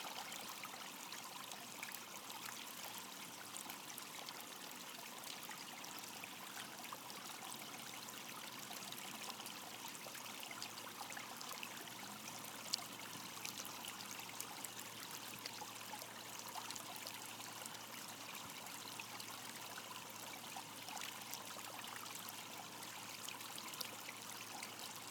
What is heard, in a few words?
AMB, urban, day, waterfeature, spillover, rock, delicate, roadside, short; Eiravaein Works; Vaeyan IV